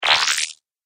Laser Squelch, Laser Sound Sound Effects

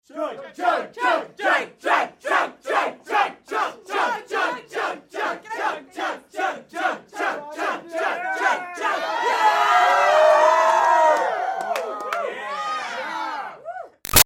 Crowd Teens Chant Chug Drink Cheer; Crowds; Big Room Sound

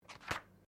Book Page Heavy Flip; Book movement; Books (Sound Effects:Offices)

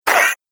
Robot Droid Death, Robot Sound Effects

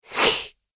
Vampire Hiss; Monster Sound Effects; Horror Sound Effect; Horror Sound Effect - Despicable Sound Effect To Send Shivers Down Your Spine! Excellent Sound Effect For Computer Games

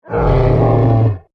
Dinosaur Snarl, Creature; Animal; Animals; BlastWave

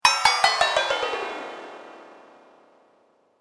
Metal Magic Down; Magic Sound Effects

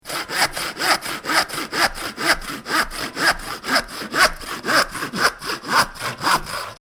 Saw Wood With Hand Saw; Saws; Machines; Stuart Duffield

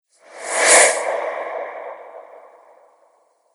Swoosh Whoosh; Whoosh Sound Effects; Swish, Zoom, Swipe, Whoosh, Swoop, Transition; Andrew Fleming

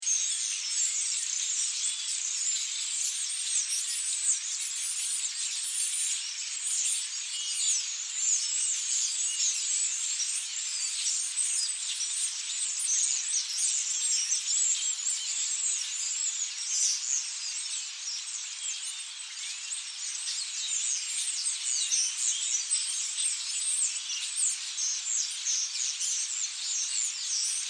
Ambience Birds Starlings Chirp Large Group Loop; Outdoors

